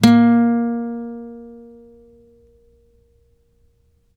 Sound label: acoustic A#2 R, guitar; East West; Steve Stevens Guitar Samples Collection; ACOUSTIC PCK